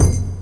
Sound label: hit, PERC; Techno Trance; DRUMS; Techno Trance Essentials